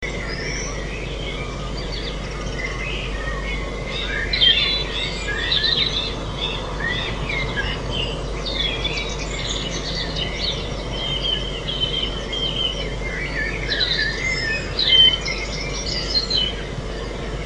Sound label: Birdsong, stereo